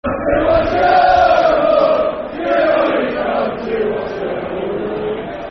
Come To Watch The Yeovil, Football Chant; Yeovil Town Ytfc; fanchants

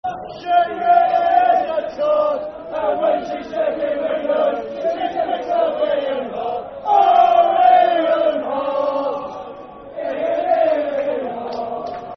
Football Chant, Brighton; Ian Hart; Brighton Bhafc

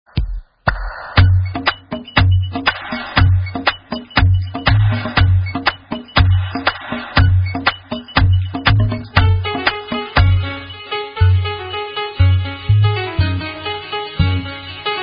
Bengali Dancehall Dub

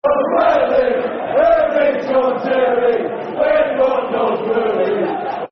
Football Chant (Soccer Song), Sunderland; Who Needs John Terry; Sunderland Safc

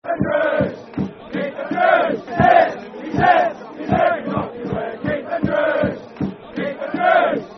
Football Chant (Soccer Song); Keith Andrews; Milton Keynes Dons Mkdfc

